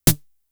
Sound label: Snare, Drum Machines; Fricke Schlagzwerg